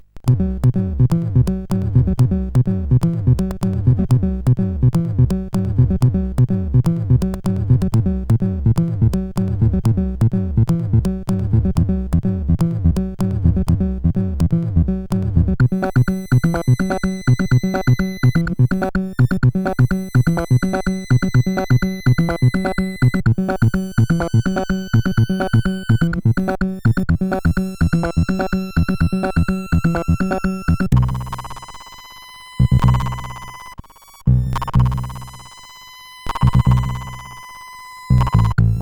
Ambient, CSG8580R5

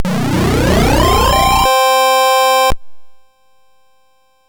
Dragonworld sid MOS6581R4

